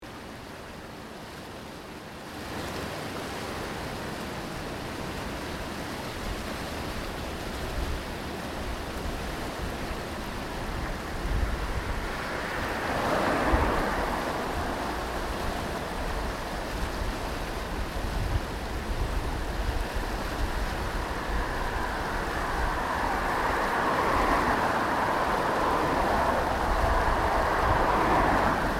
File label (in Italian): suoni adige ponte; borgo sacco